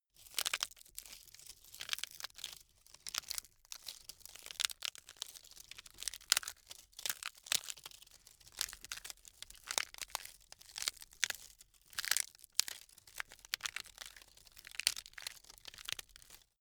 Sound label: bamboo crack sequence